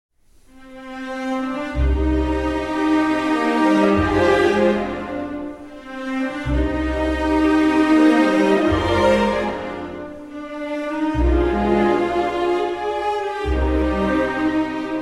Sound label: Symphony in F Major, Poco Allegretto; Brahms Symphony No. 3; Op. 90